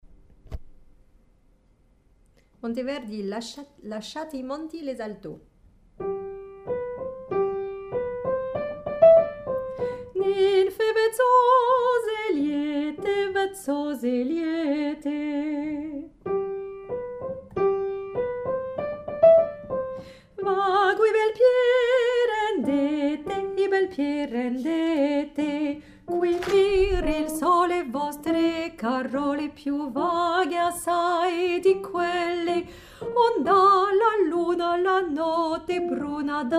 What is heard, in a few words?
Alto